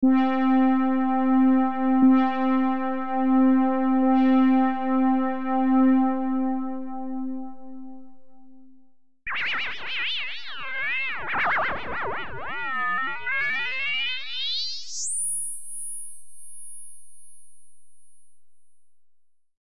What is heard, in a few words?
synth